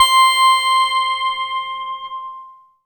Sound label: VS BELLI; Synclavier Sampler Library; KEYS AND GUITARS